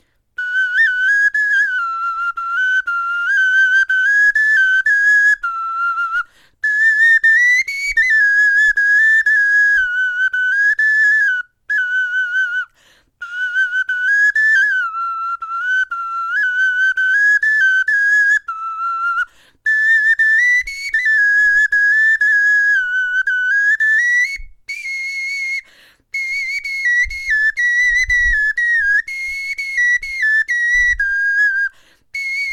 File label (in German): melodie, knochenfloeten